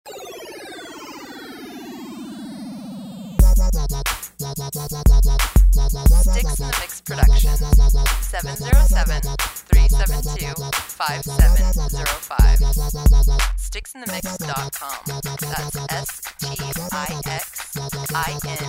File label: bounce